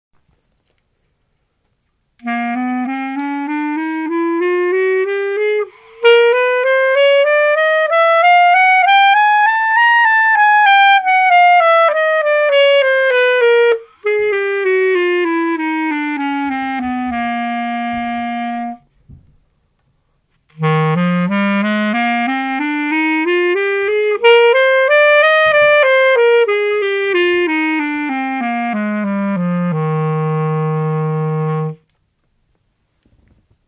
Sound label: Clarinet scales